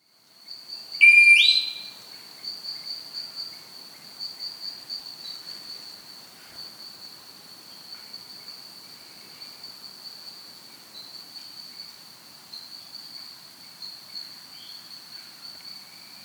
Gray-Tailed Piha (Lipaugus Subalaris), Andean Birds; S. America